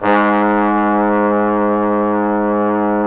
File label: BRS FF BON, Bone Sec.1; Roland LCDP06 Brass Sections